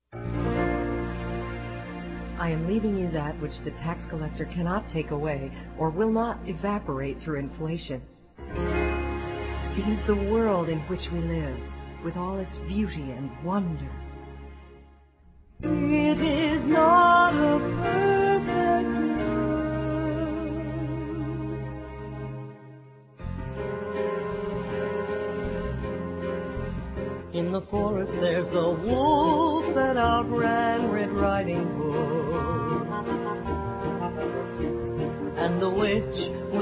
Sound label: children